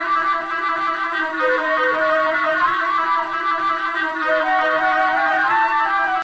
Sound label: flute cover